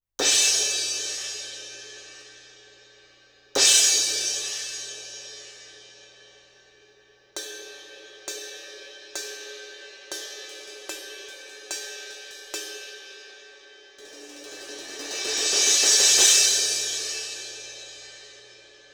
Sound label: Cymb Crash; 30 Degrees From Vertical